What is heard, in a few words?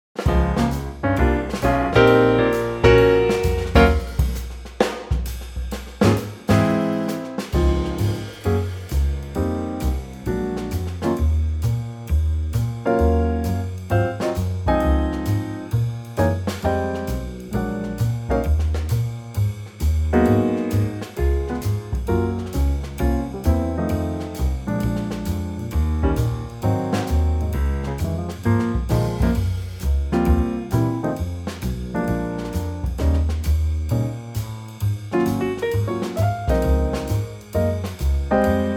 Trio Db